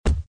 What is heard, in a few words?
tick